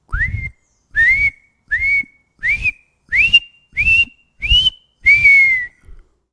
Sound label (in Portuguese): assobiando como o seis-meses; voa fazendo um som diferente do pio habitual, que, por sua vez, lembra o chacoalhar bem agitado de conchas; Pia o pio… Os pássaros e as árvores que fazem parte da vida do Martim